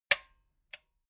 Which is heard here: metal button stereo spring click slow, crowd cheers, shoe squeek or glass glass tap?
metal button stereo spring click slow